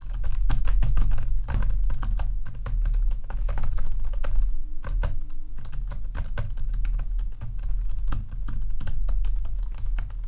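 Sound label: Keyboard Sounds; Science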